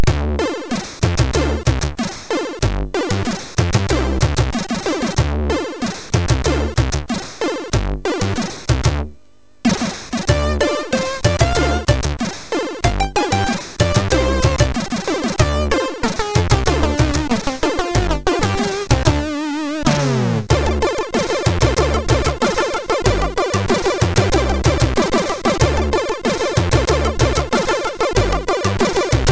jazz vibe, MOS6581R3

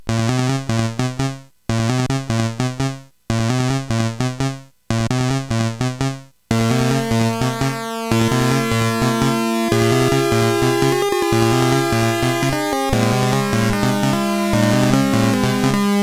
Bass Theme, sid CSG8580R5; HVSC

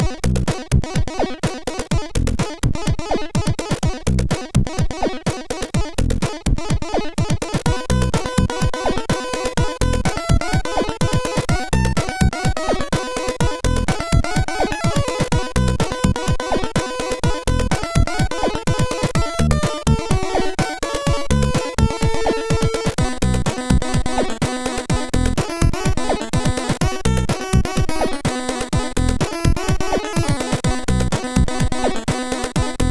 Arpeggio, MOS6581R4; hvsc

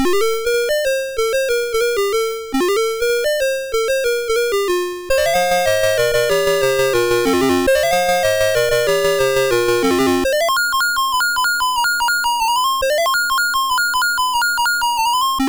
Quari, MOS6581R2

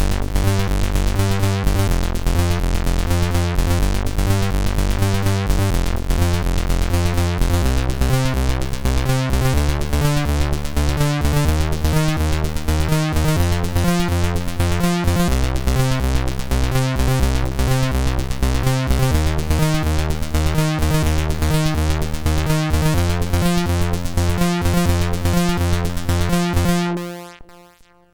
Bassy, MOS6581R3

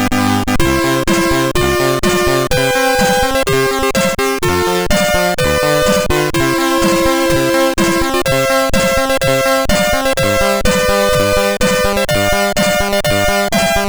French Anthem, MOS6581R3; Wijnhoven Joachim

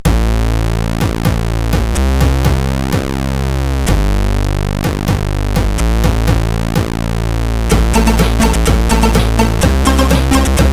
Intro, CSG8580R5; Baboons Intro 4; hvsc; Fate

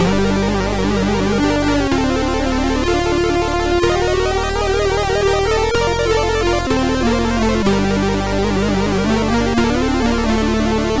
Music sid MOS6581R3

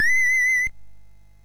sid MOS6581R2; Conquestador

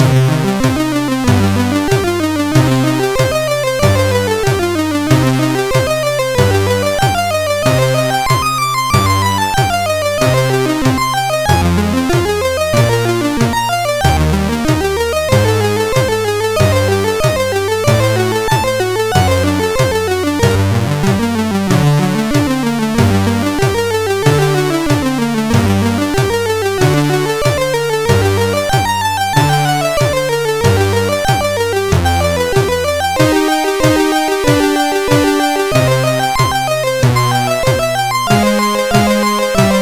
Solfeggio, sid CSG8580R5; Amadeus Meka Design

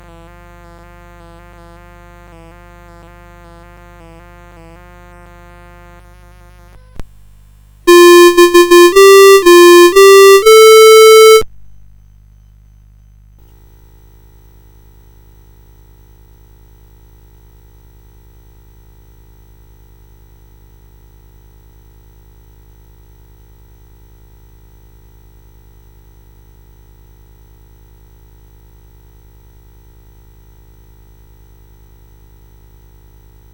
Royal Intro, SoundEffects

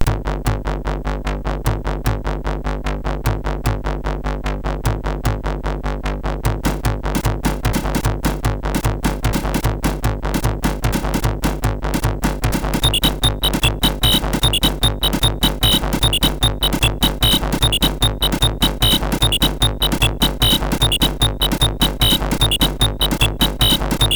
intro, MOS6581R4

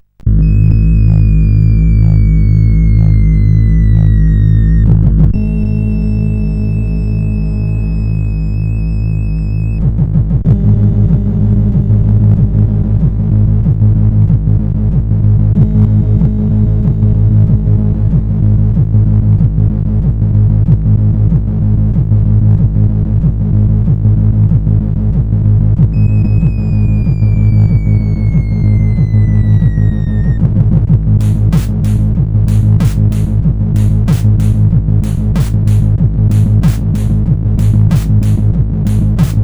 Monotone sid CSG8580

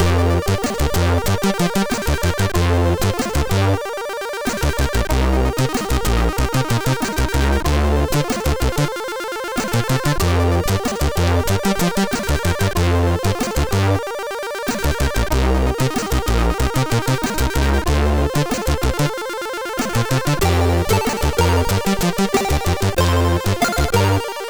Dwarf Fly sid MOS6581R3

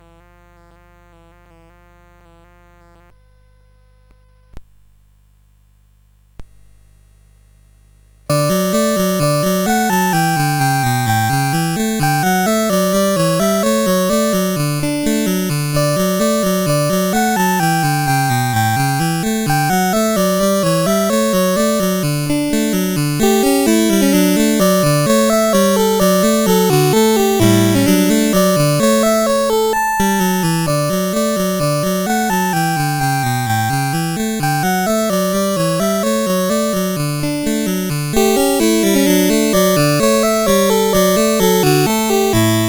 Adagio CSG8580R5; Joseph A Grau; Les Petits Riens

